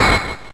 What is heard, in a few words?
Corkscrew Crash; pokemon reborn e19 2022